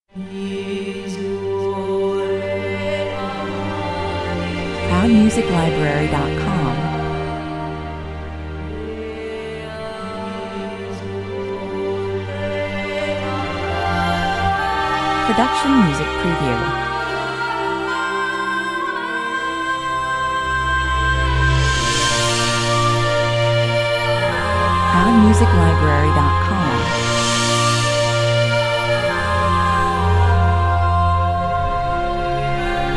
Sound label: orient 104 bpm